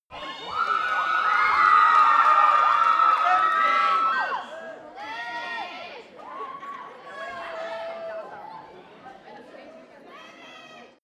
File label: shout young audience theatre front